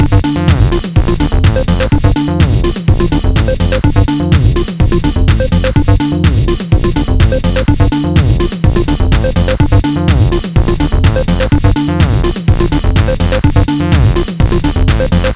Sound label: Square From 80; abx3 bundle